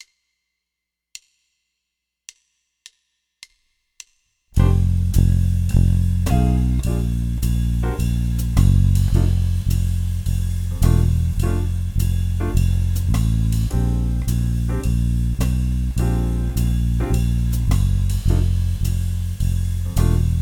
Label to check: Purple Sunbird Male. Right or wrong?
Wrong